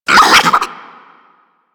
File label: Infested Biped Flip; Warframe; Moa